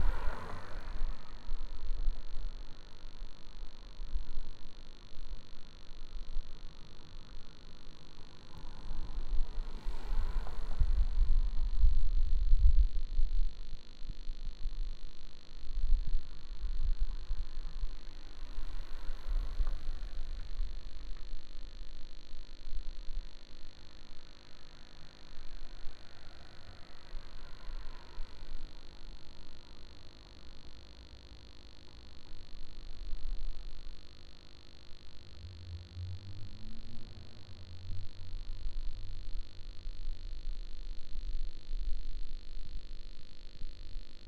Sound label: buzz